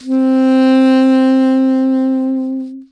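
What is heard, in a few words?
saxophone soprano C octave0 vibrato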